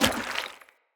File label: Heavy Grunt Boot Walk Water, Footsteps; Enemy; Sinecure